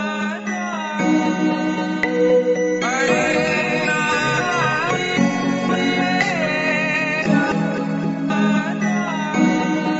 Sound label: instrumental